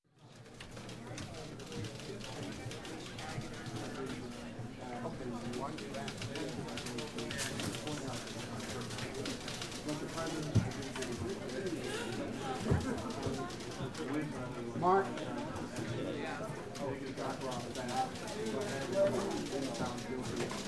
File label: Calm News Office Ambience